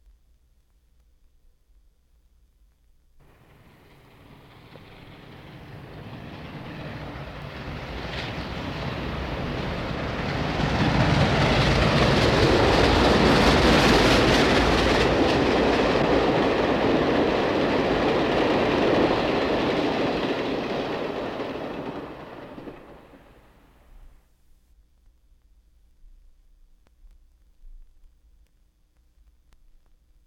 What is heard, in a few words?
Passenger Train Passes Rapidly; Jac Holzman; Authentic Sound Effects Volume 2